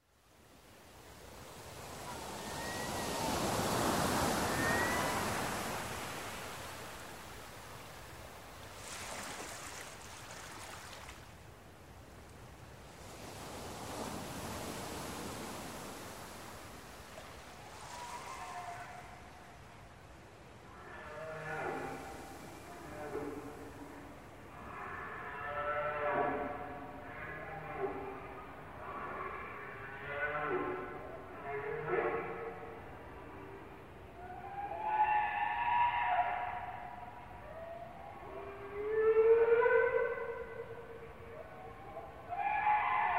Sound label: Humpback Whales